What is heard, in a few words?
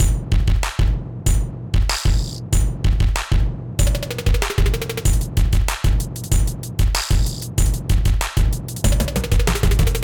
Kit, Clips; Kindified Kit; Ableton; Bomblastic